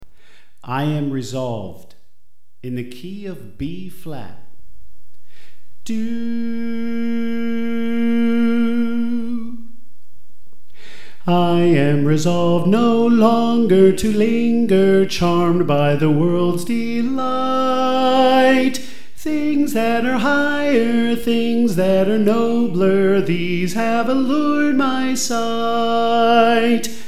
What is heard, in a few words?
Soprano; I Am Resolved